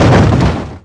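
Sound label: Collision Interior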